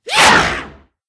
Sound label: Bird Red Charge; Angry Birds